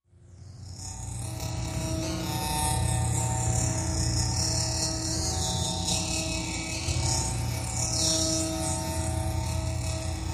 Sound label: Sewer Song Hollow Tunne, musical